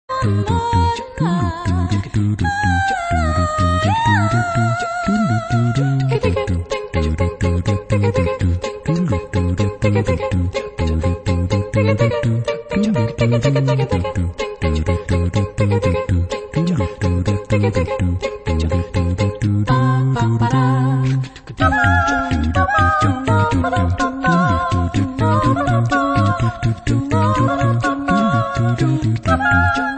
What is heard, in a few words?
a cappella; Roland's Rat Race